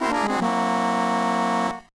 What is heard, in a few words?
Secret Battle - Lose; mario party advance